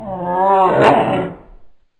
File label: taller pain; cof